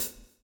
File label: swing